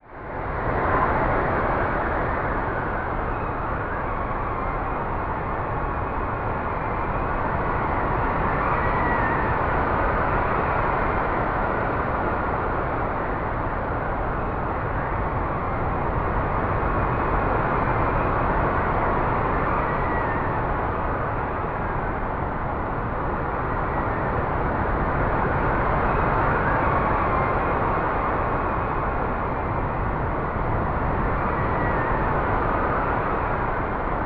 Weather Ambience Hurricane Storm Wind Strong Gusts; AdobeCC